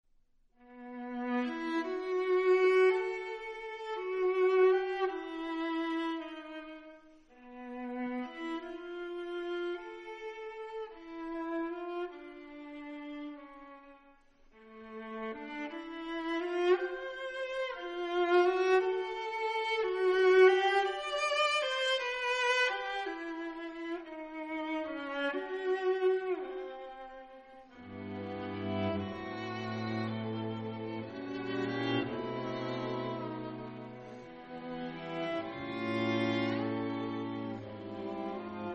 Quatuor la

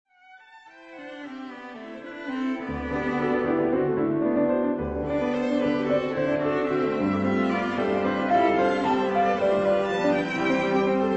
Quatuor piano; Paris : Heugel, 1946; 4. Allegro assai

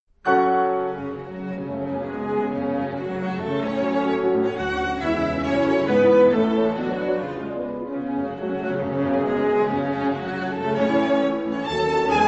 Quintette

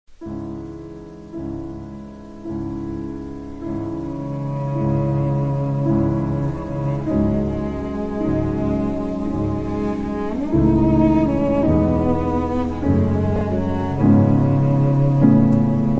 Quintette; Quintette en fa dièse mineur, piano et quatuor à cordes; (Paris : Heugel, 1923); 2. Andante (non troppo lento)

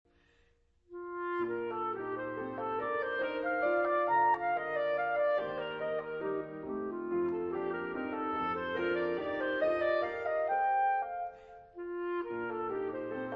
Clarinette

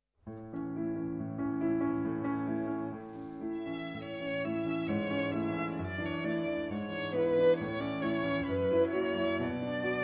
Romance violon piano

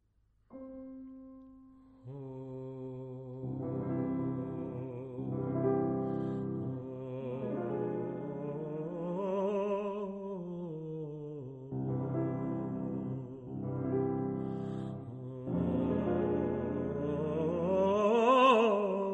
Vocalise-etude

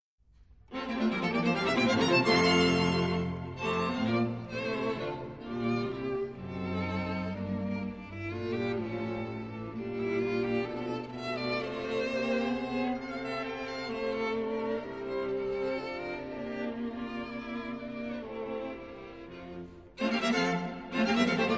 Quatuor Fa

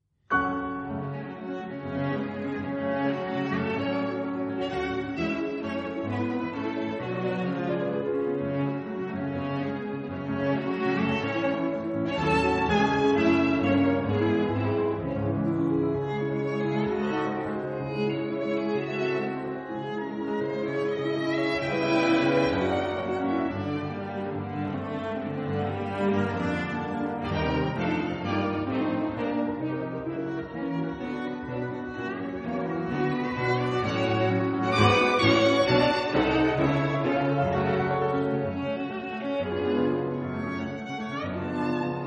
Quintette

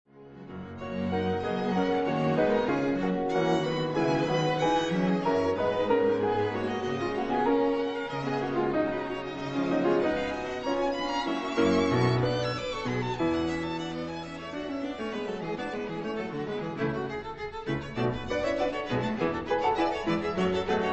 Quintette

